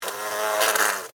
Hornet Take Off, Animal, Hornet, Take, Off, Land, Short, Fly, Wings, Buzz, Insect, Bug; Blast Wave